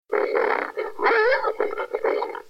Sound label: oink